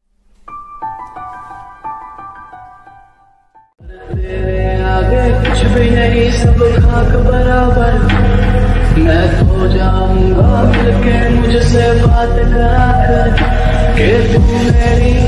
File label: romantic ringtone